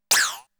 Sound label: Firing Sound; Namco Game Sound Express Vol.1; Valkyrie no Densetsu